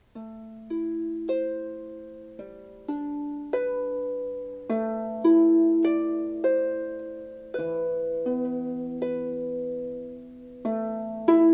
harp playing

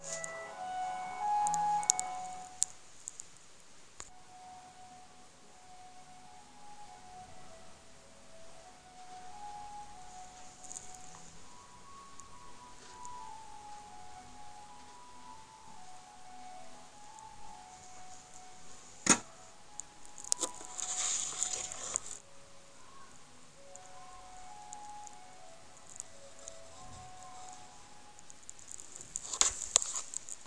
new icecream tune

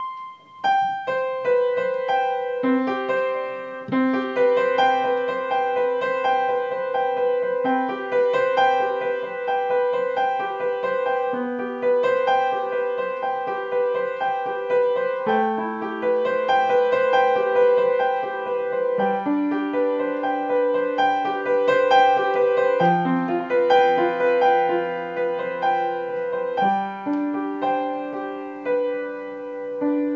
piano

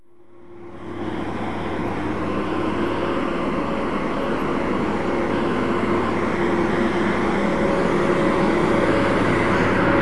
road sweeper on Oystermouth Road, Swansea

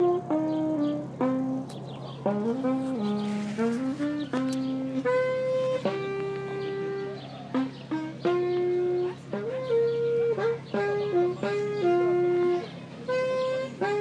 saxophone at treptower park

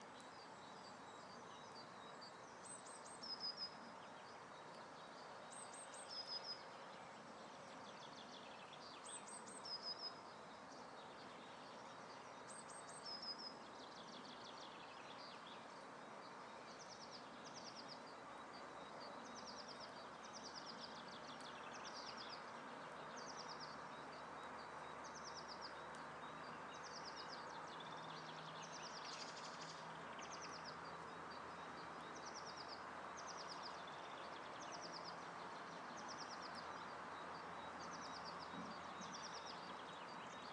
early birds

